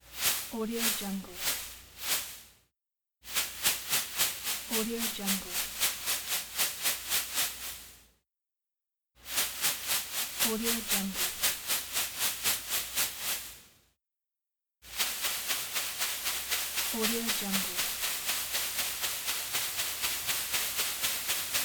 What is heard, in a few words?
cheerleader pompom shakes pack